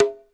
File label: Conga, classic hip hop; STYLE KITS; Classic Hip Hop Mega Kit